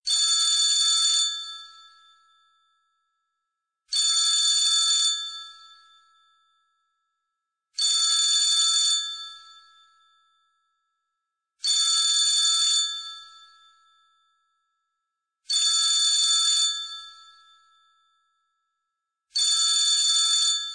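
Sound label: telephone ringing sound, on-hold music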